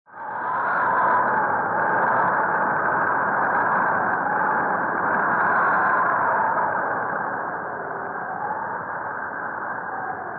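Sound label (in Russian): арктический шторм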